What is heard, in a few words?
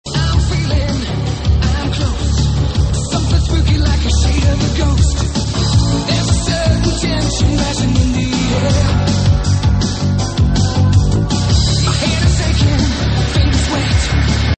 Tension Rising